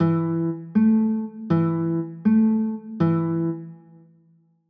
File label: a 6, chords